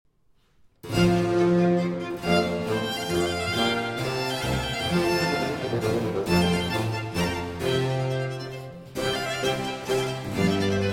Concerto For Flute, 2 Violins and Basso Continuo in G Major, Allegro